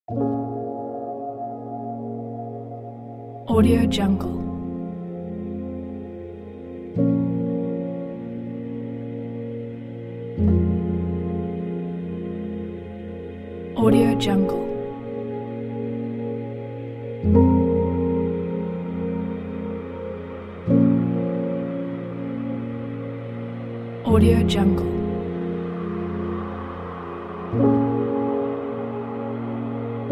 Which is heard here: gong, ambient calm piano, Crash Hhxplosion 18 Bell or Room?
ambient calm piano